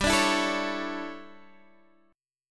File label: D Major 7th Suspended 2nd Suspended 4th